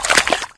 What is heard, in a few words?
mud, player footsteps